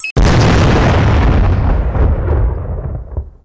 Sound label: mine detonate, weapons; Tribes2